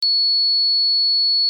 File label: tone 400